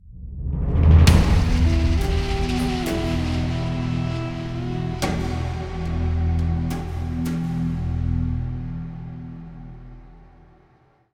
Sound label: Act II Stinger; Gordian Quest